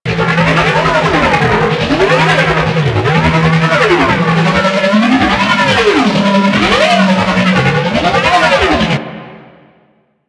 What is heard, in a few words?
Jamming Drone Engine Loop; Warframe; Corpus; Corpus Railjack